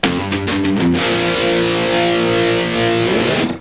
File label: Riff